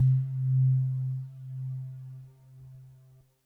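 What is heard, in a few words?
sine C2, Yamaha VP-1; Music Store; 90's Sample CDs; NICE SINUS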